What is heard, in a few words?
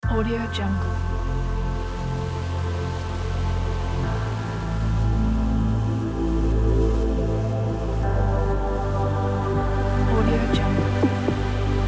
bright chill kit